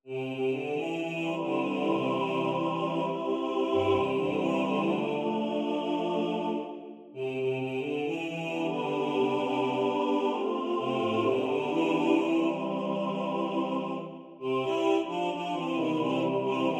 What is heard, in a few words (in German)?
Stimmen gleich gewichtet, Männerchor; zum Üben